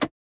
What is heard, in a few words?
perc